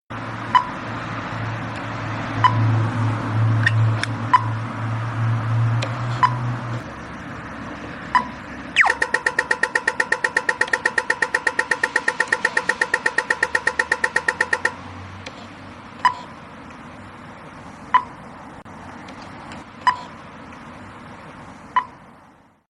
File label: street sound